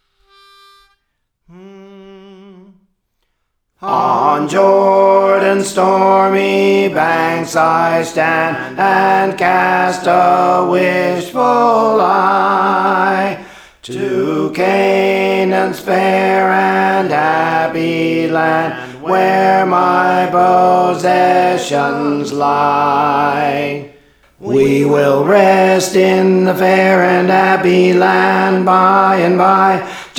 Alto, Songs; Praise For The Lord Training; On Jordans Stormy Banks (OKane)

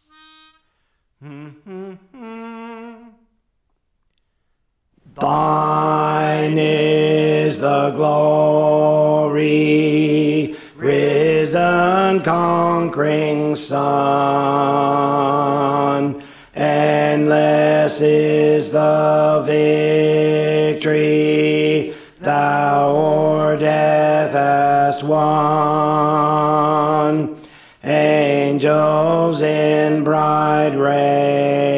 Alto; Thine Is the Glory; Praise For The Lord Training

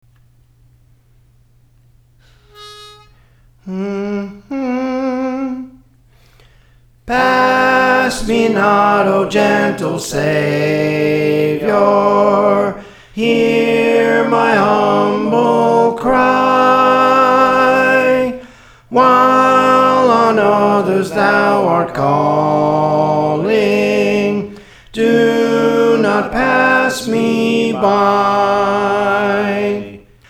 Soprano, Praise For The Lord Training; Pass Me Not

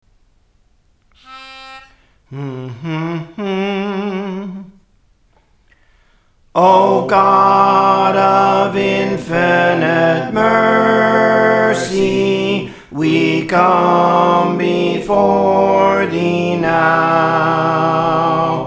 Soprano, Songs; Praise For The Lord Training; O God of Infinite Mercy

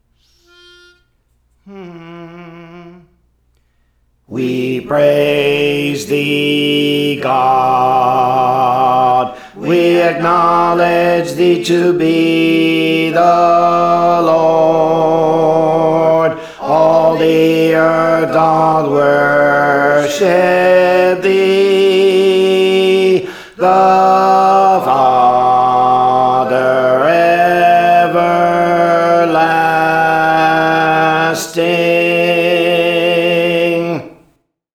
Alto; Praise For The Lord Training

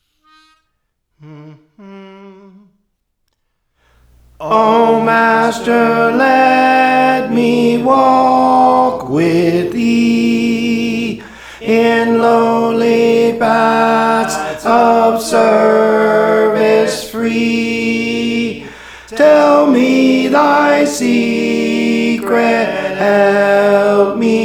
Tenor, Songs; Praise For The Lord Training

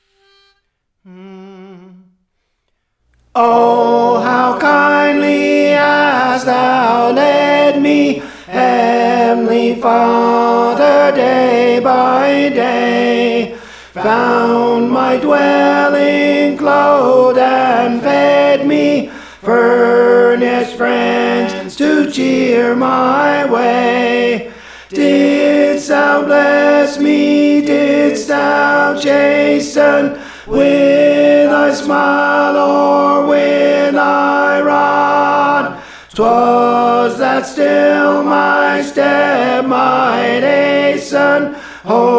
Tenor, Songs; Praise For The Lord Training

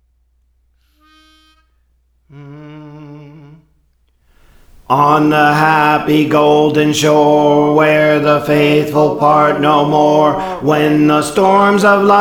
Bass, Songs; Praise For The Lord Training

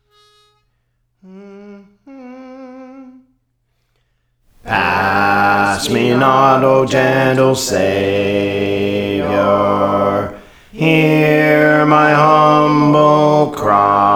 Bass, Praise For The Lord Training; Pass Me Not

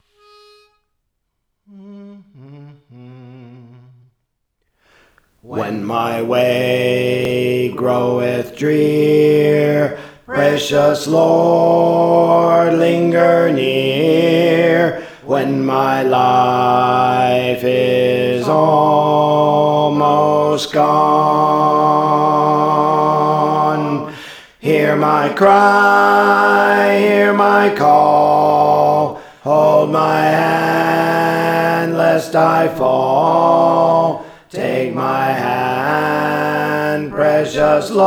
Alto; Praise For The Lord Training

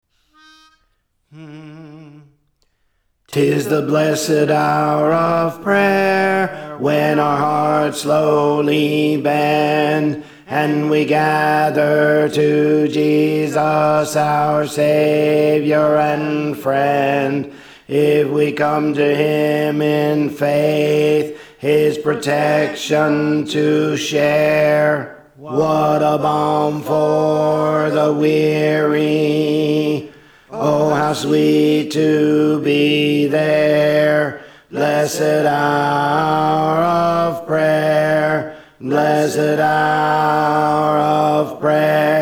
Alto; Tis the Blessed Hour of Prayer; Praise For The Lord Training

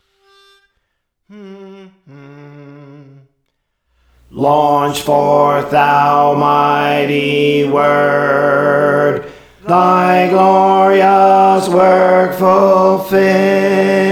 Alto; Praise For The Lord Training

